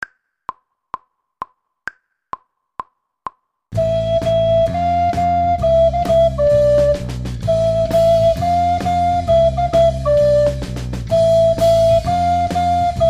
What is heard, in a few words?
treble recorder